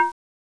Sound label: Saw A2